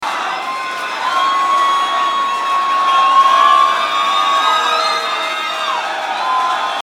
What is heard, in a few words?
Crowd Chanting